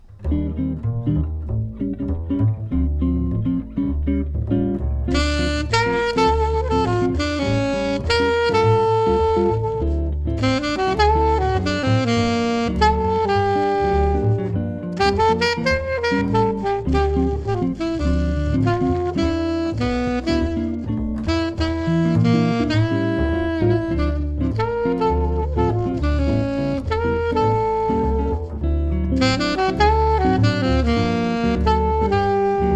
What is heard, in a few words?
trio guitar